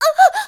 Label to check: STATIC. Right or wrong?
Wrong